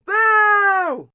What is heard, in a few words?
Boo Short, Booing, female